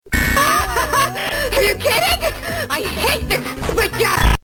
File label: stun